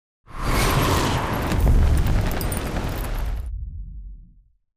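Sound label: fastball lob end whoosh; Titanfall 2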